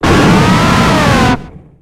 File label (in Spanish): Grito de Druddigon